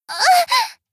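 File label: attack; asuna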